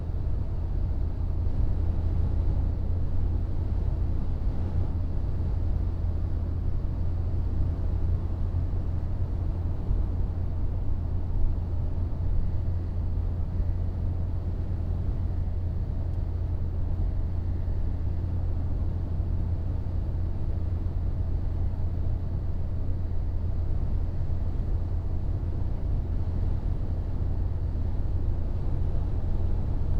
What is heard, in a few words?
Fullsize Car 130 Kmh 2 cardiod mics, Background noise